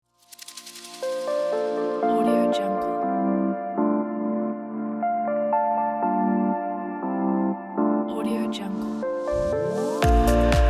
ambient house logo

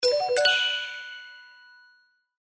chat message

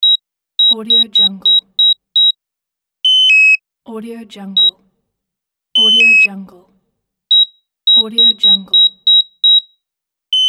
synthesized door code entering

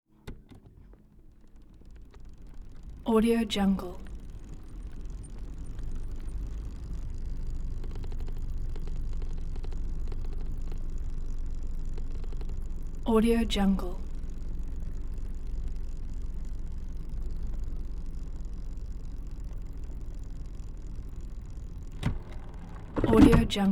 1991 kawasaki dirt bike enduro

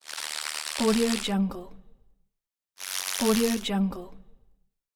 bug fly

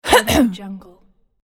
female cough

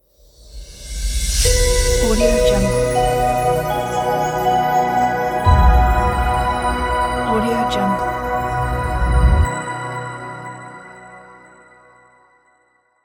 logo opener

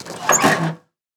lawn mower clutch; prosoundfx

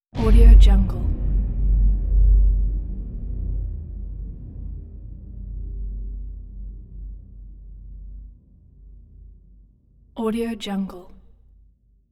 dramatic deep wooden impact

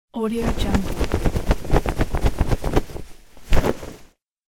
cloth flicking fast

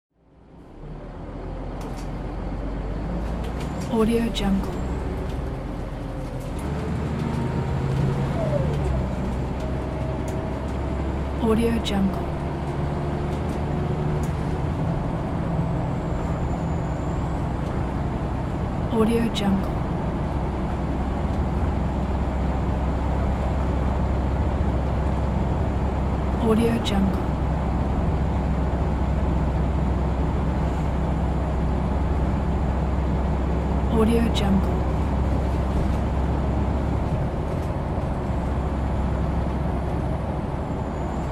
bus ride

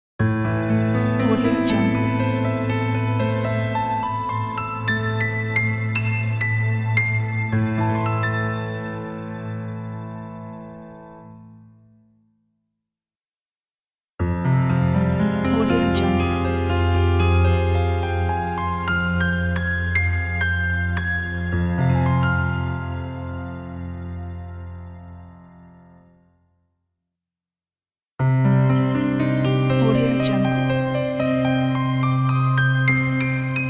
piano logo

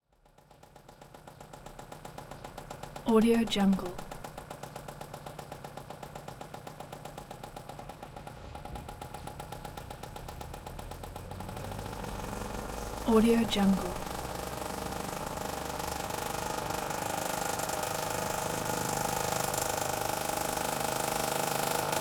1979 cimatti moped funky 50cc; prosoundfx

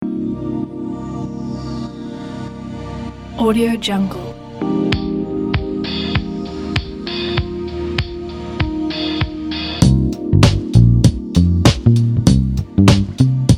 smooth chill lounge pack

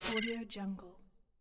interface

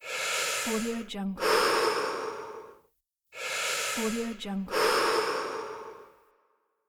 inhale exhale

